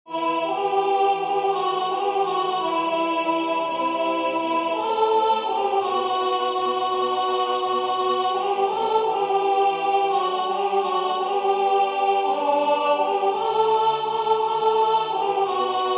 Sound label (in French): Vous etes dans mon ame soprane